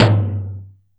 Tom, Yamaha DD-20

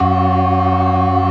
STOPS, Synth and Organ

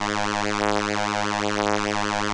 ELKA, String Machines; ELKA RHAP

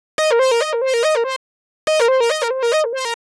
Warbs 142C, uk garage samples, 142bpm, Synths

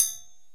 PERC & KALIMBA

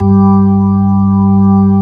ORG, Organs

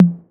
808 TOMS; Electronic; Wizoo - Platinum 24 Electronic

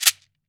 MARACA; AKAI S-Series CD-ROM Sound Library VOL-5; SHAKER.MARAC

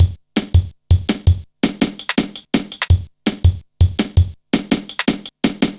Foot Tapz, Drum Loops 165.5; Zero-G Jungle Warfare 1, 2 & 3

